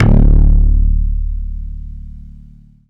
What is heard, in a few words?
Deep dist. DX 7, baesse